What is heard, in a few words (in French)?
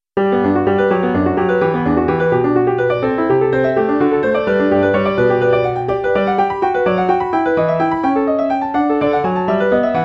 etude pour piano